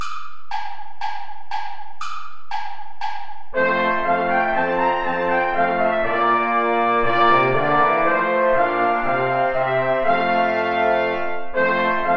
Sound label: play along without trombone; steuerlein johann; mit lieb bin ich umfangen